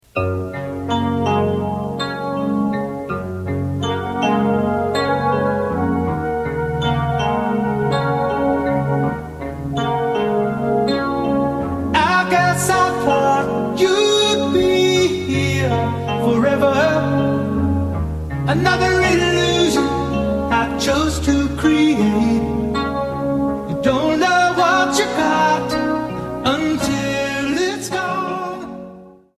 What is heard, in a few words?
yamaha dx7